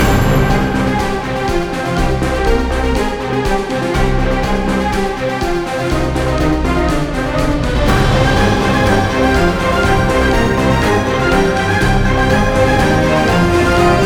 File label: Winter High Threat Loop